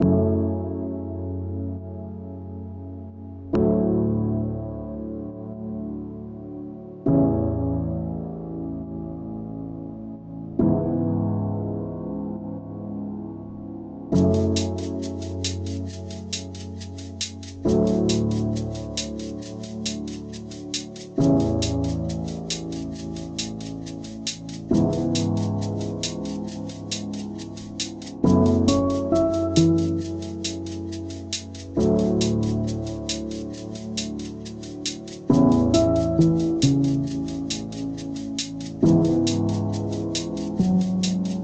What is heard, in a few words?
Lo-Fi